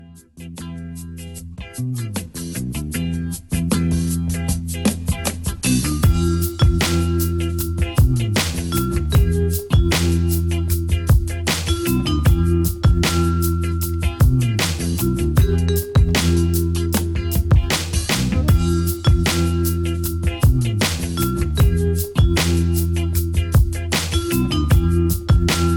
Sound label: jazzy waves